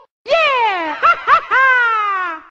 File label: Yeah HAHAHA